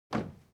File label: foley step crowd march quiet single left; smartsoundfx